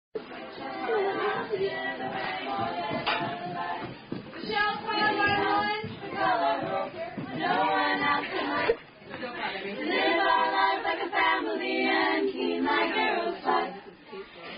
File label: sorority song; Pi Phi Song; Unknown Sorority Song